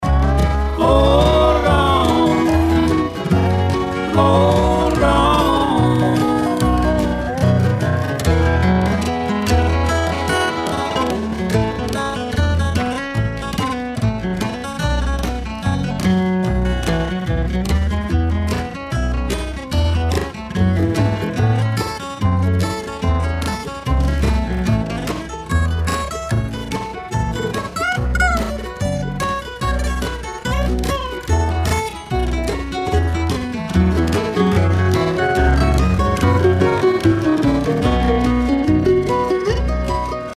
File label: Guitar Solo Adur pomalu